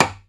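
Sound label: closed rim, Timbale; Timbale Battery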